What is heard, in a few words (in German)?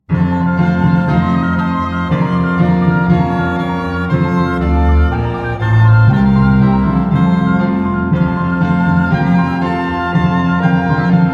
Freude schöner Götterfunken Karaoke